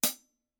formula 602 classic sounds 15 heavy hi-hat closed; paiste

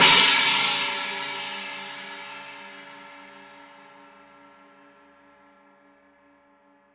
novo china edge; paiste; rude

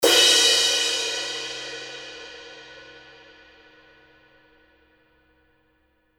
2002 black 20 big beat edge, paiste

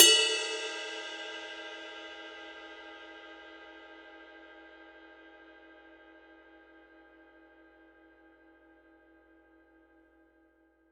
22 power ride bell, paiste 2002

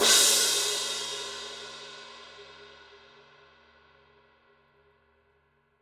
18 dark crash edge, paiste; masters

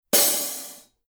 16 hi-hat open; paiste; giant beat

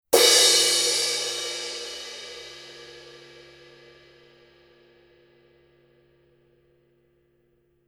900 series 19 crash edge, paiste

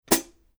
14 thin hi-hat chick; paiste; masters

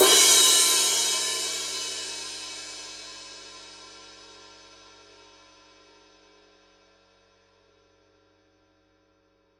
rude 19 crash-ride edge, paiste

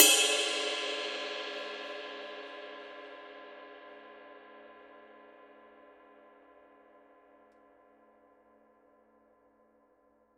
22 dark crisp ride bell; paiste; masters

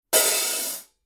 color sound 900 15 blue heavy hi-hat open, paiste

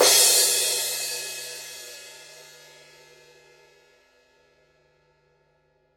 18 crash edge, paiste; pst 7

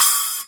8 splash choke, paiste; pst 5

